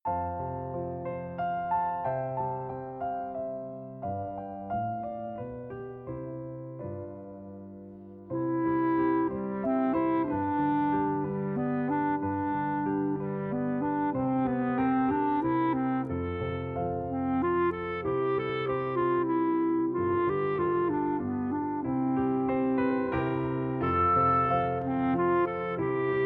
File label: waltz